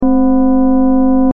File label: Sine FM Modulation; gravity-force-lab-basics